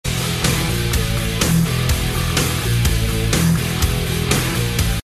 rock sms

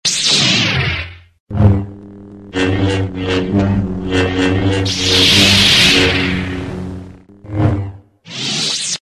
Lightsaber Sound

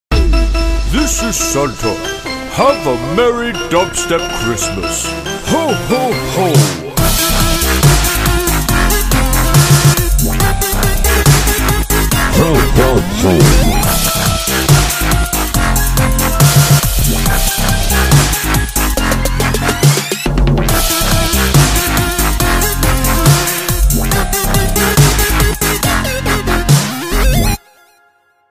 Xmas Dubstep; iPhone 13 Pro Max

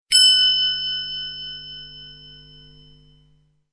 ting beat

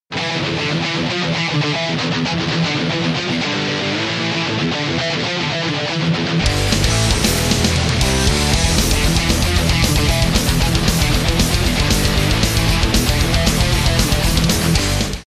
rock

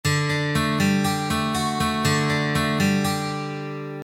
rock guitar sms

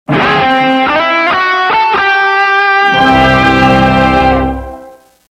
rock sms

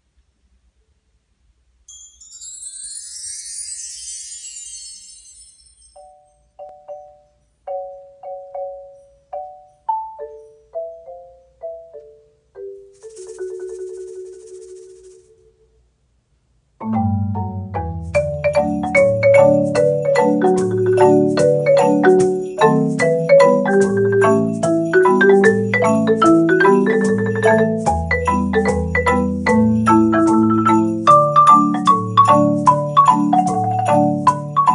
Christmas Marimba

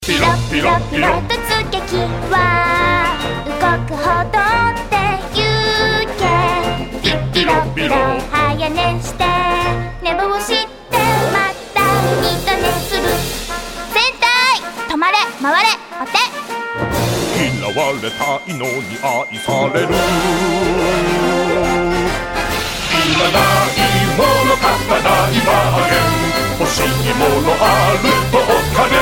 Melody

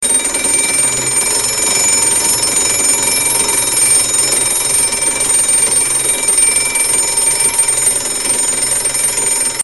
alarm

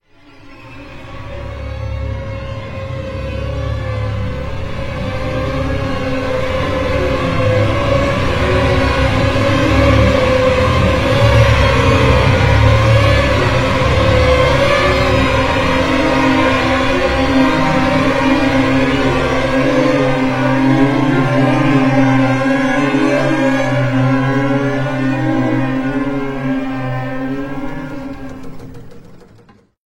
Scary Horror Strings

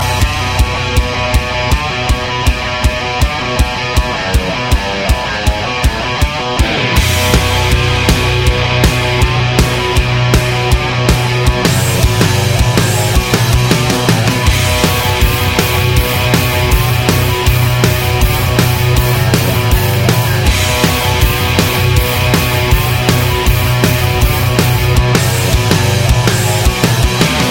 rock

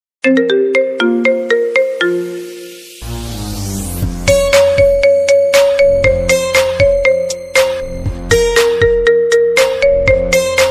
Marimba

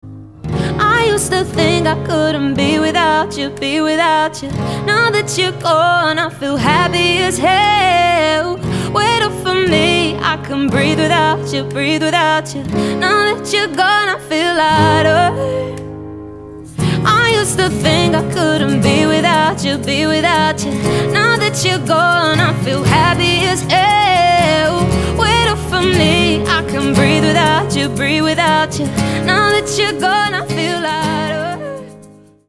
Acoustic

